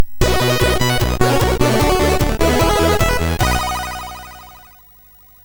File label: MOS6581R3, sid